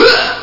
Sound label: uuhhh; Sounds Terrific II; Weird Science; Amiga